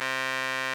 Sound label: RMI EP; Sonic Reality - Vintage Timetraveler